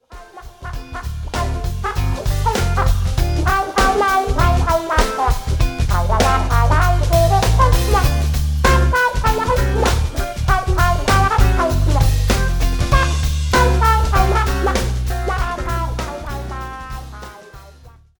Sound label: red horn